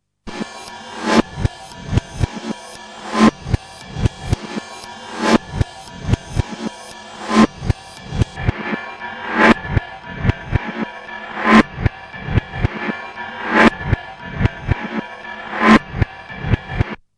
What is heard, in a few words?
reverse drum